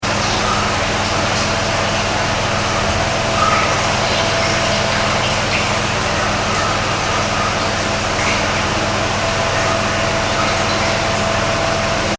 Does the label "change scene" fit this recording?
No